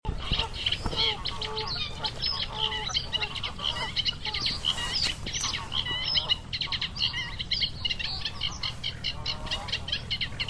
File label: Reed; wildlife